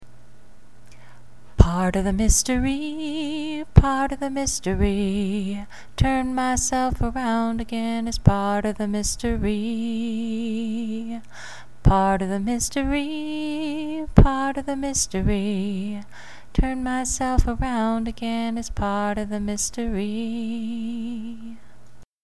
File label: voice 3